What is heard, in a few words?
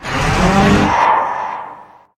mine cart creak; darwinia demo